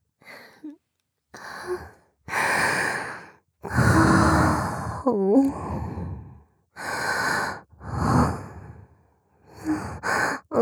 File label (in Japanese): チン嗅ぎと裏筋舐め上げ 左 吐息, ASMR